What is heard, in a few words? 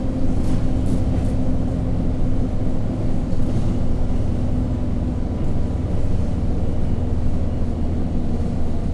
Bus Ride Squeaky and Noisy Lo-Fi Lavalier mic